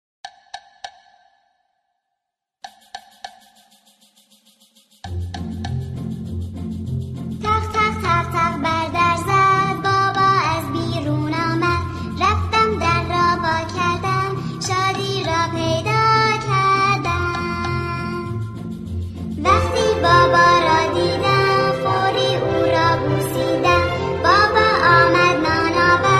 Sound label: Happy children’s song